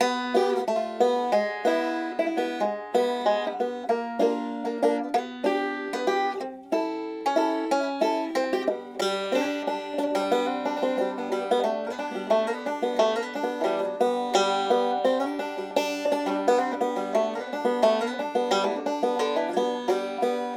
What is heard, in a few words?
String